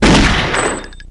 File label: gunshot